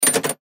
Arcade Machine, Arcade Machine Weight Horoscope Reader Antique Press Button Metal; Technology; Arcades; Blastwave